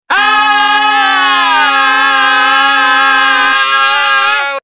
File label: airlines screaming